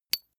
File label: small metal impact medium high brillant